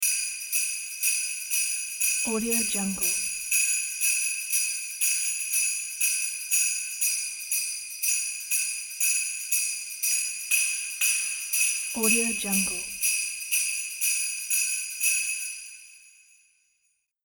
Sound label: soft christmas sleigh bells